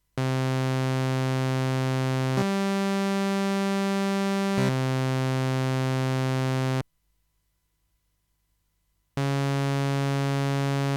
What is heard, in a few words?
SOVTE Fifths